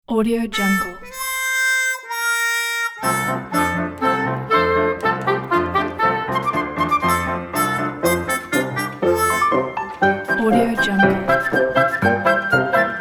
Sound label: funny puppet trumpet